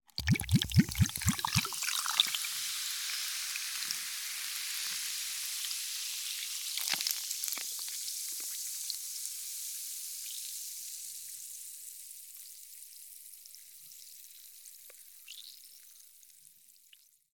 Liquids, ginger beer, pour, plop, gurgling, fizzy, effervescent, close; Eiravaein Works